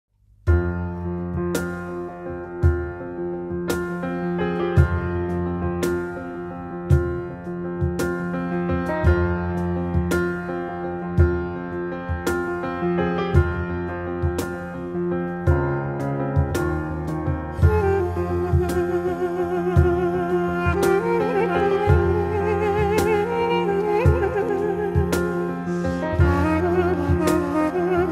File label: live acoustic